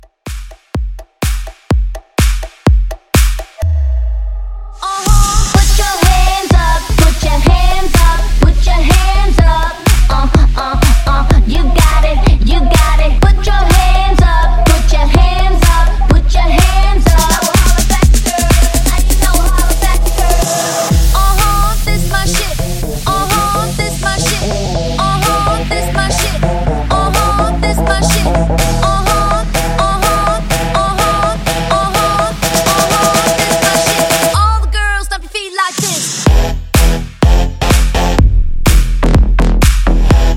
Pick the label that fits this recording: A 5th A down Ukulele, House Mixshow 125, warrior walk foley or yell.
House Mixshow 125